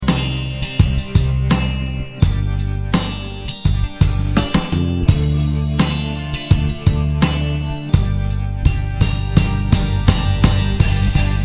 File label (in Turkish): bk-7, roland bk-7m ritim modul